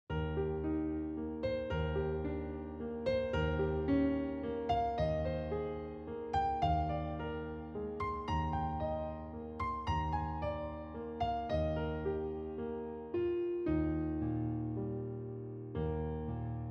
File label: with flute